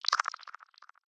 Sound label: Tech, Weird Side Samples